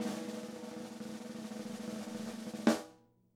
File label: Sn Roll; Roland MV8800